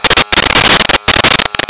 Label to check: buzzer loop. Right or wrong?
Right